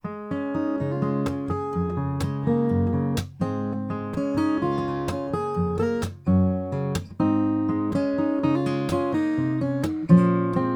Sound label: Sontronics AC GTR Sigma21 Stereo L-R